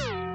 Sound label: menu system enter; sfx; WILLIAMS; INDIANA JONES; Indiana-Jones-Original